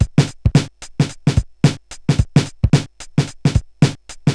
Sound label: Korg MR-16 (110BPM), beats